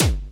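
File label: snare drum bass drum, Dance Mega Drums; Best Service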